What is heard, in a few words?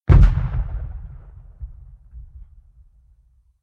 Gun Sound